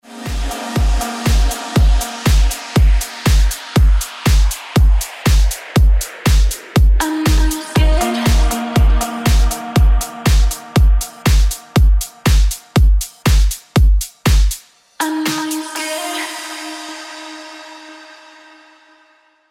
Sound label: Original Mix Ringtone